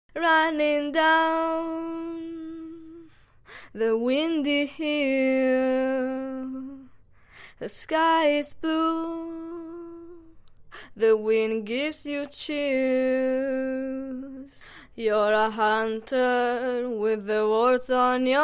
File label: pop 63 CM